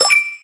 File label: Coin; Rapo